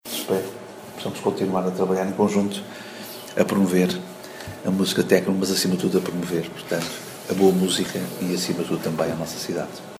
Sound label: techno; neopop; Viana do Castelo; a capital do techno